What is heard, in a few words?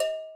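percussion Agogo High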